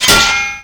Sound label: Pickup Armour; Pickups